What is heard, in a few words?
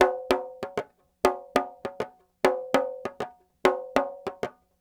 Petia, World Percussion